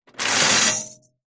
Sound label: keys large bunch prison guard pick up from metal surface hollow